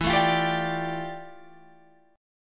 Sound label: F Major 13th E, guitar chord